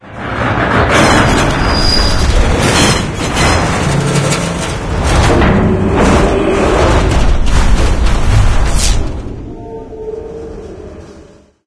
van ride start; ambient; source engine; episodic; intro

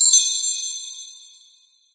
amb random tech; source engine; portal2

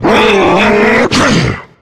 melee, voice attack; video game; source engine; left 4 dead 2; charger

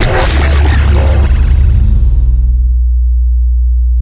heal; vaccinator; tf

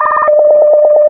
rocket locked beep; Source Engine; Portal 2

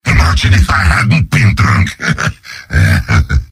demoman cheers; mvm

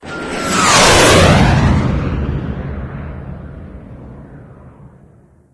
jet by, mono; Left 4 Dead 2; Source Engine; jets

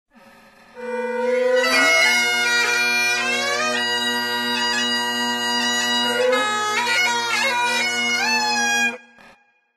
badpipes, taunts; Source Engine; tf

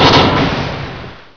doorstop, doors; source engine; hl1; video games

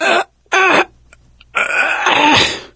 choke, gambler, survivor voice

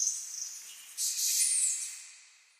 amb african birds; source engine; portal2

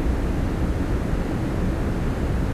tone

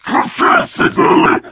charger lurk, voice idle; source engine; left 4 dead 2

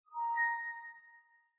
windows print complete; operating systems

